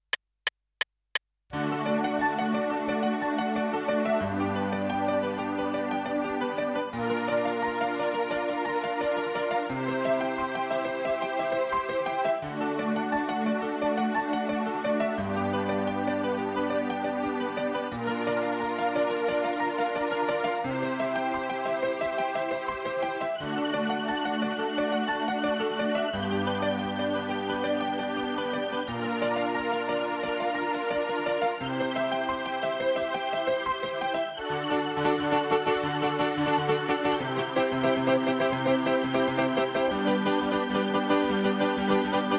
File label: instrumental